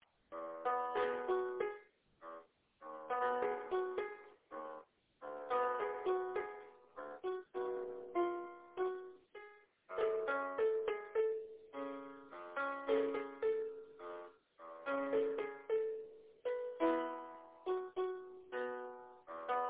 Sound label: piano part like a musical